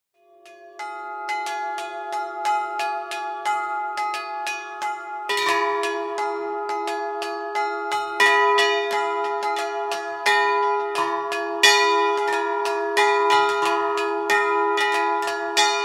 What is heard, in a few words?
Bells; Christ Is Born